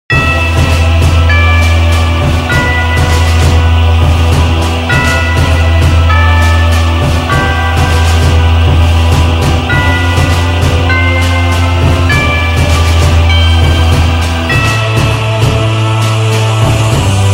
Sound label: intro music; calamity